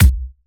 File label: Bassdrum; Vengeance Samples; VEE Bassdrums